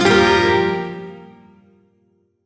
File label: Shawzin Phrygian Chord; Warframe; Duviri